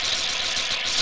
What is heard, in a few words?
rain